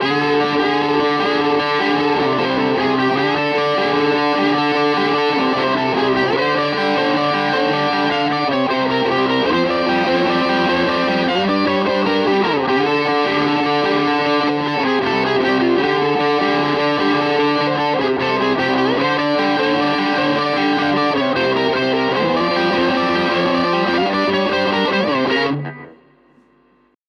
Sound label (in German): Maybach Harley Benton Vergleich Maybach Zerre